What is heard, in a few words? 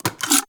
Fire Mech, Flame Thrower; Warframe; Weapons; Ignis Wraith